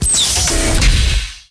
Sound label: Tank; Starcraft